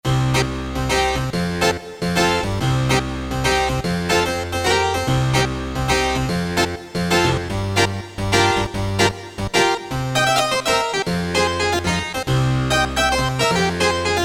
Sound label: Harpsichord 4'; Korg DSS-1; Harpsichord & Organ